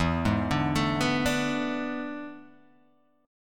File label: E 11th, Guitar, Open G